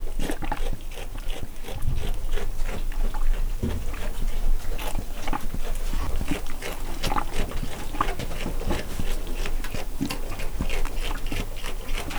kecske lefetyel; parasztudvar; hazikecske

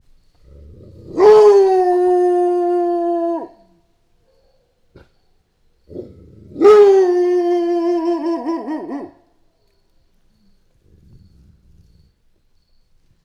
kétszer közelről vonyít 50 Hz, szürke farkas; hortobagyi nemzeti park 2013

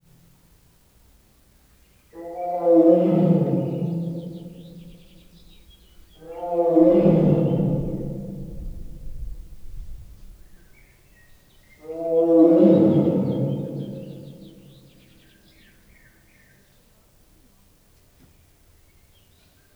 távolról a tigrisházban üvöltő állat alulvezérelt zajszűrt; veszpremi zoo 2013

